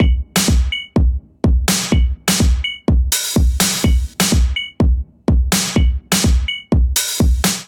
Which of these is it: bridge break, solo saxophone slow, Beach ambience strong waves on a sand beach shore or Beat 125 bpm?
Beat 125 bpm